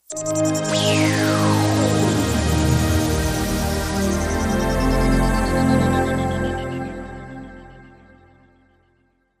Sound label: Light n Smooth Ident